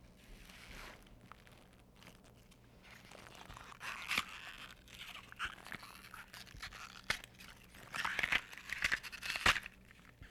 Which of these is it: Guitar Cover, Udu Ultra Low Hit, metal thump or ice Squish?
ice Squish